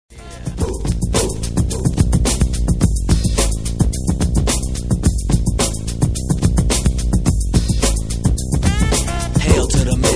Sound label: groove; scarface